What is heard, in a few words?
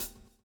swing